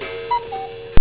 cuckoo clock

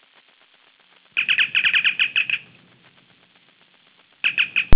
communicator chirps; startrek

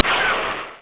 rocket; battalion

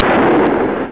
crash; battalion

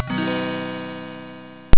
chord

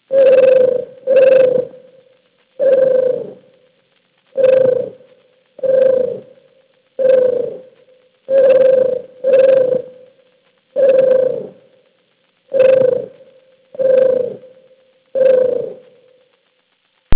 tribble coo; startrek

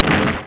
boom; battalion

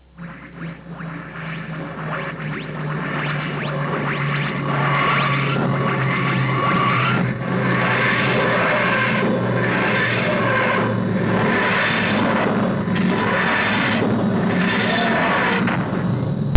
tardis; drwho

